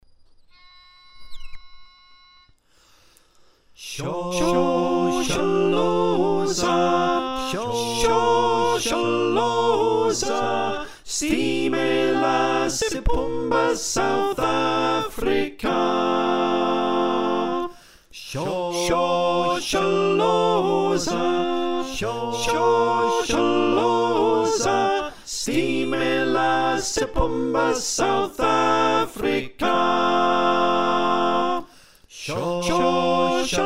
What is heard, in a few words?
SATB, choir; Shosholoza